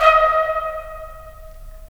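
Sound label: brass trumpets staccato, right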